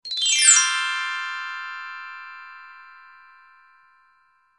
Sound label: Tooth Fairy Alert Tone